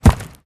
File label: Fishmen Footsteps Dirt Run; we are the dwarves gamerip 2016